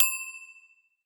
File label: bell elevator bell